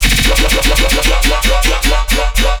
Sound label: bass hit metal bubbles E; Virtual Riot